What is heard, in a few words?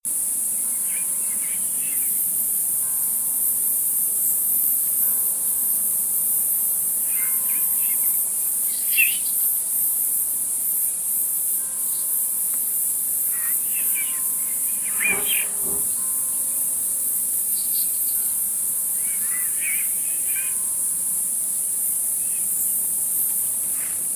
bells short